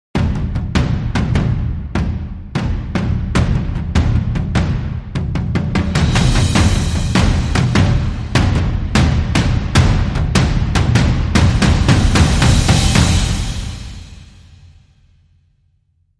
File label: Dramatic Sting Perc